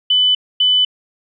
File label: DP Two Chime